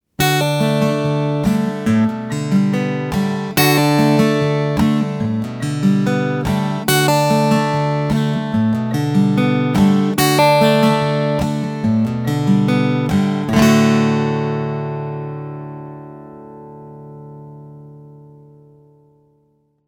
DADGAD chords example, acoustic chords; 30 acoustic chords

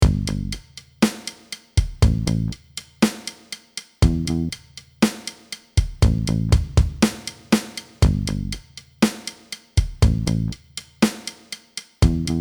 Rim, snare drum

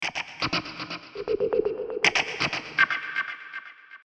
Rhythmic (120BPM), cinematic drama

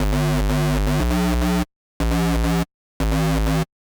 Full frequency bass

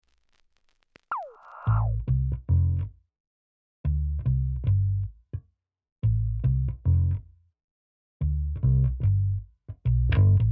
Wider FX

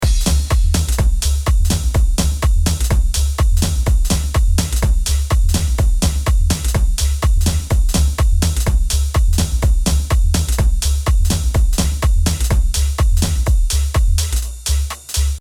Loop no low cut

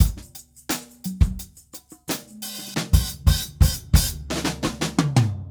Kit Drums Fill; Smokeys Kit

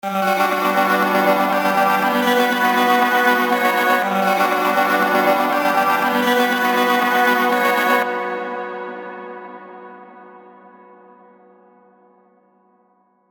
Basic Long Reverb

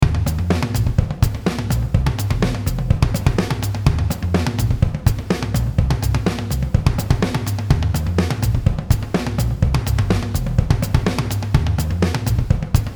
Pedalled with toms; DrBeat

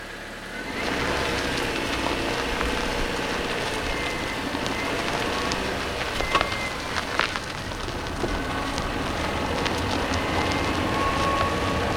Car Audio

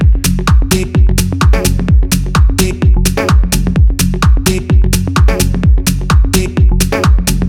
glitch fills

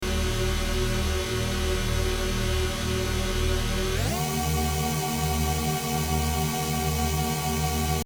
Resampled sound; 10 track starters

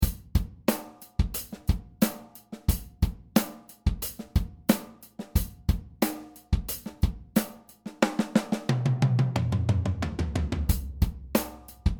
Dynamic fill, drum

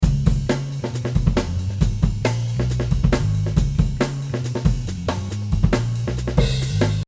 With Amen groove template applied; amen break

